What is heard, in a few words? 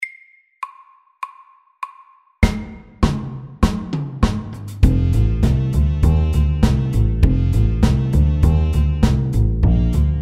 Walking bass